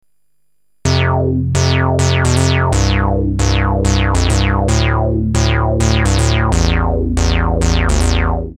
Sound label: fifths